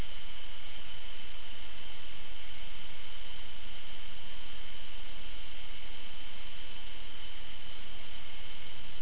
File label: night sounds